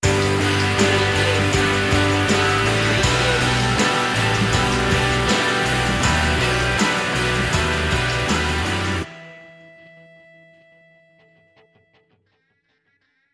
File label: sting